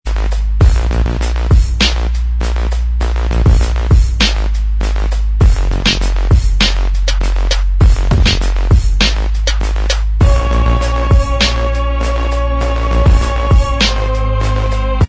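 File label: Instrumental Track, backing tracks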